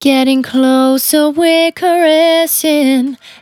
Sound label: Finally 70bpm C, Velvet Vocal; Zero-G